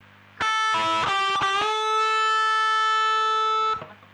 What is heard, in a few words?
riff